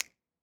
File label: Snap